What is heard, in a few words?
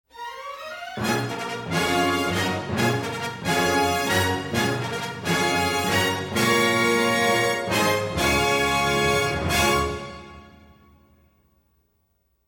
Fanfare